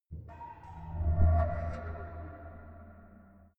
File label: ringing impacts